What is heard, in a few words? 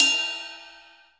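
20-inch bell A, cymbals; InVision Interactive; Lightware VOL-1; Instruments & Percussions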